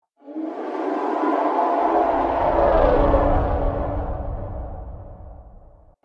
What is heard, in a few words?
leviathan sounds distant; reaper